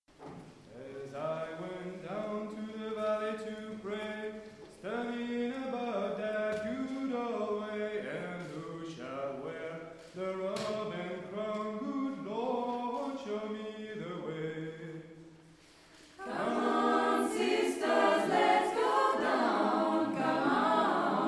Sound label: Schola, Down to the river to pray